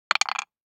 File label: DICE on Wooden Tray, Throw and Roll, Standard, 1 One Die; Samples; ArticulatedSounds; DICE Sfx Party (Extended)